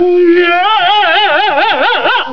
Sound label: yahahahaha